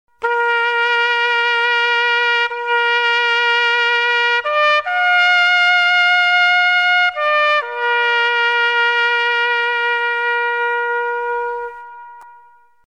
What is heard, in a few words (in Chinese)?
熄灯号; 学校常用铃声